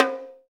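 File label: percussion low bongo; Roland L-CD701; Latin 1; Bongos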